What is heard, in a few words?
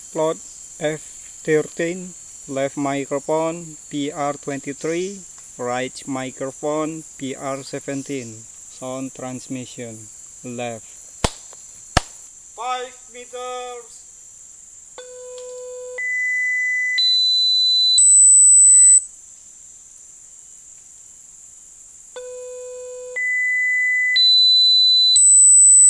birds